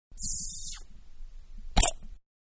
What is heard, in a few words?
Beer Bottle Open; Drink Mixing; Bars; Bottle Beer-Opening; Manhattan Production Music